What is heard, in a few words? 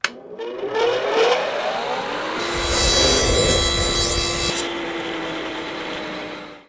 cutting tool, hand mining